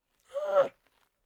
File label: baby camel; prosoundfx